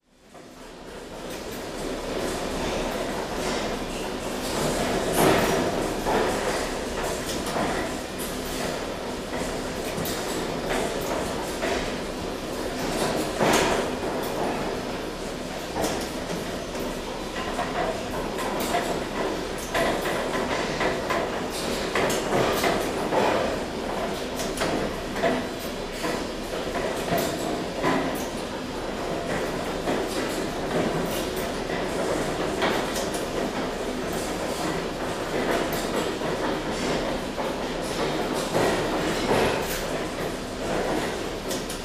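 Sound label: Plastic Factory Industrial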